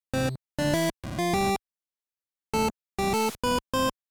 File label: Retro 16 bit or 8 bit success melody win victory